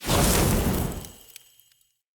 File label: Short Sword Fire Whoosh; Weapons; Elemental